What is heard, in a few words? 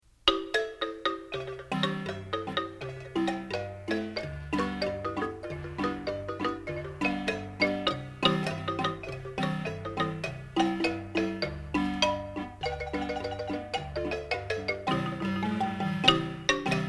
marimba